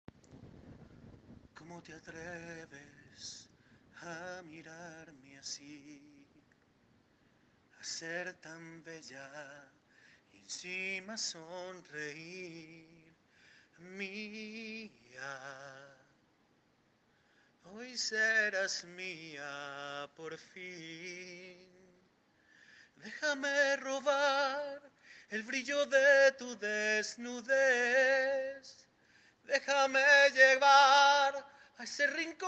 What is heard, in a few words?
Vibrato song male